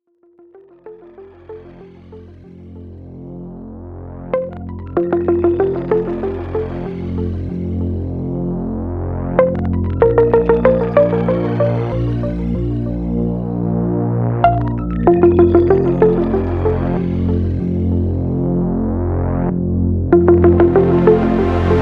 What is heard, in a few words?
Pop Drops